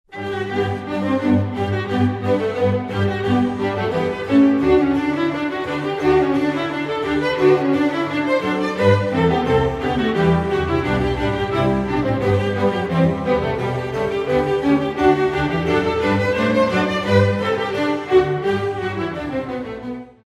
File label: Classical Brandenburg Concerto No. 3